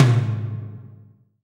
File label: TOM; Best Service Gigapack 2